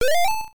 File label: Coin; Super Othello arcade gamerip 1986